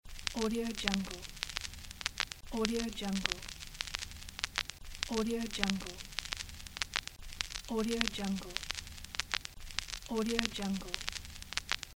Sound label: vinyl record crackling effect; erhanaarassfx